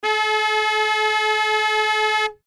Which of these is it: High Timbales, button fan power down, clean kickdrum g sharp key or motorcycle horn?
motorcycle horn